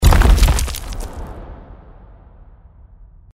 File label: tunnel Collapse; Dynamite Digger Eureka